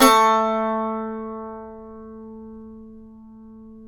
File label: SAZ; Spectrasonics; Hans Zimmer Guitars VOL-2